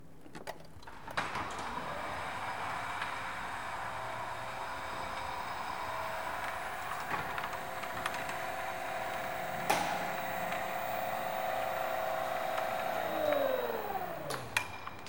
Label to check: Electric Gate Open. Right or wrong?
Right